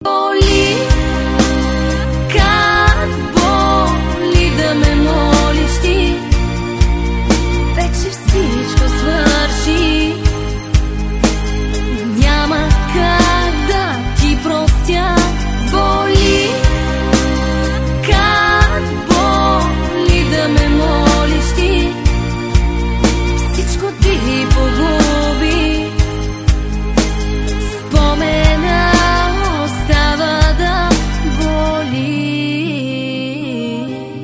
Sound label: (acoustic version)